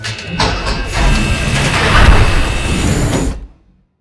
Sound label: close airlock, ships; CosmicRage